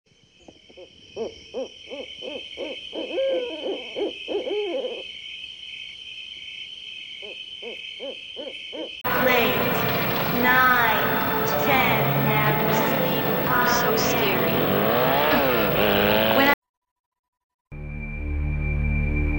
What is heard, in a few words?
Scary Sounds Gothic; 1001 Sound Effects; Halloween Scary Music and Sound Effects